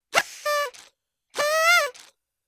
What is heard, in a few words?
Party horn